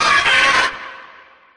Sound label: cry